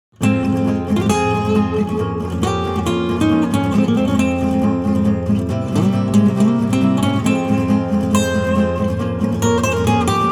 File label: Acoustic; Vegas Theme